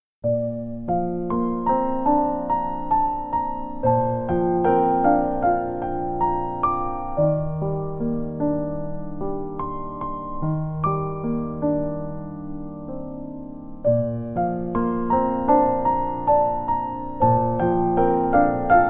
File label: Piano ver.